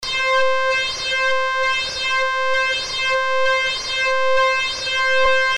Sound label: FM LFO